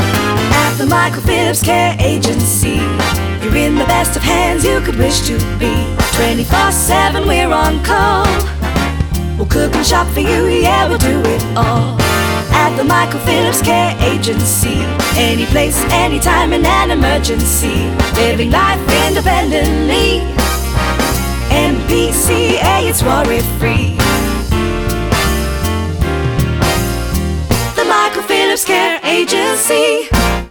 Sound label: jingle